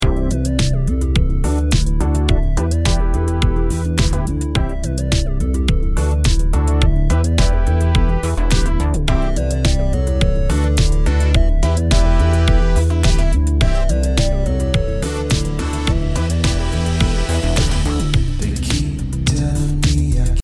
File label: electro multi synths